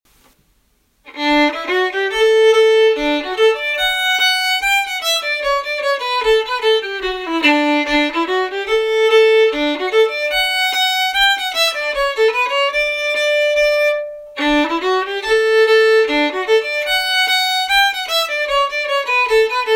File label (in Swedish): Jubileums Schottis